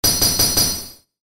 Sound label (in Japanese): ランク レア度4